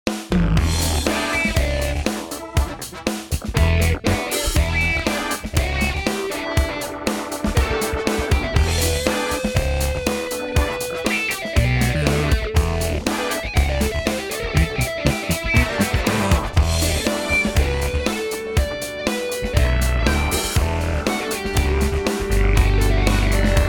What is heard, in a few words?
Funk Fusion